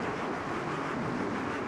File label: Abyss Shriek Black Stuff Enter Room Loop